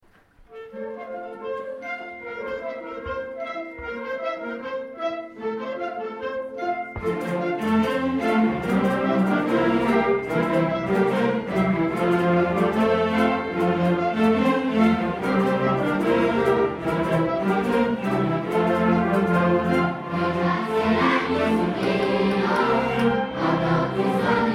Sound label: Samba; Samba-lele